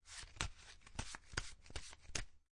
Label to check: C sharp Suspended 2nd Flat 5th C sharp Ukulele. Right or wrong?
Wrong